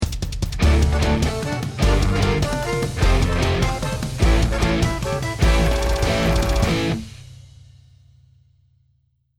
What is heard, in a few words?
won round